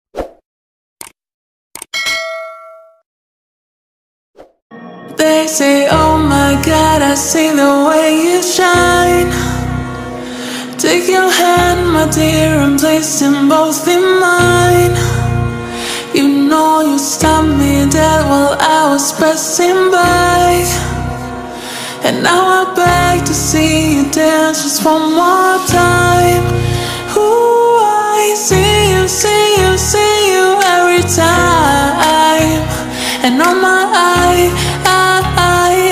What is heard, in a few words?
lofi slowed and reverb song